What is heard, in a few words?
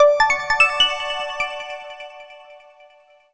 Clear power on; Power Sounds